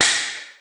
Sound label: toggle off